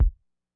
Kick, drumtraks

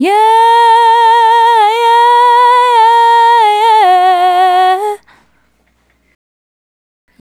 Female Solo, Vocal

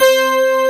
BRS PF C3, brass; AKAI S6000 CD-ROM - Volume 1; SAMPLE WAVE MIXING 1

